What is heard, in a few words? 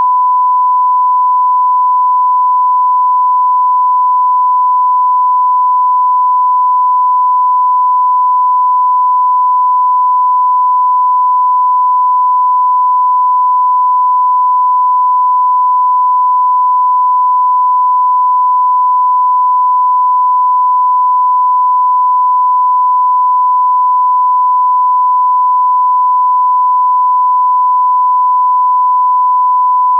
sinus 1kHz